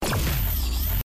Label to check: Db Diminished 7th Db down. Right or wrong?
Wrong